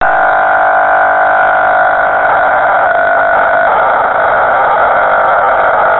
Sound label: beacon during thermal vacuum test